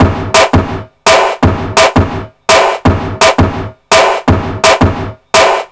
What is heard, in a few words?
168 BK, 168 BREAK; USB Soundscan vol.05; Explosive Jungle; AKAI; 90s sample CD